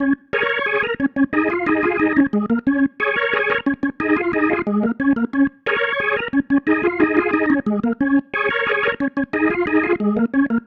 Pattern, Organ

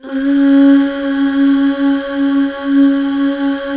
UUHH, Back Uuh Fem

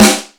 GATE SN, Snare

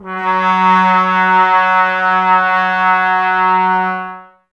brass, Tbs Mute

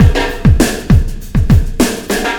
Sky Lab Beat, Processed Drumkits

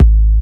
sub A, techno trance bass; Techno Trance Essentials

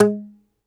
DOMRA, Segundo Domra, Plucked Strings

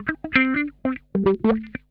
HIDE N SEEK, Funk Guitar; 90 s Sample CDs; Zero G

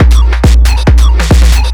drum 138, set-of-signals, drum-loops, Techno; Techno ID

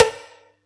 Yamaha EX-5

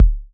kick machine; kicks

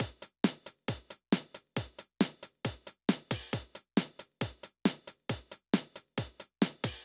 136 No Kick; Zero-G Trance Inducer; Construction Kits; 136bpm

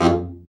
BRA BARI, Bariton Sax

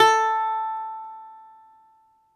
BALALAIKA, Prima Balalaika, Plucked Strings

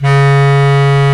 WND BS CLAR, Bs Clarinet, Orchestral Winds

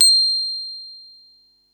mallets

